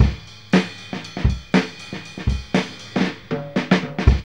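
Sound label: 118 bpm, Funky loops; Zero-G; Funky Elements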